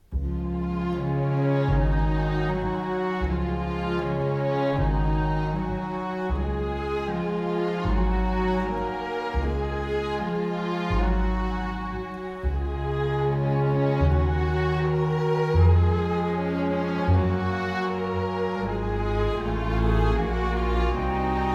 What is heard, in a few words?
nursery music